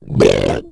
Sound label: wall; cstrike; umbrella swarm; boomer